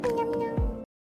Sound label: miao miao